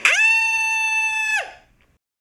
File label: yell